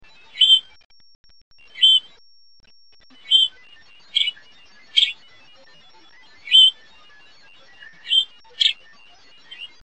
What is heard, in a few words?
Calls and songs of juvenile are more abbreviated, less melodic, than that of adults